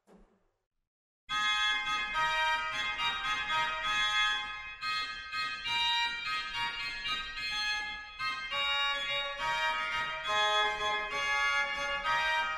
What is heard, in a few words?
Mixtura; waltershausen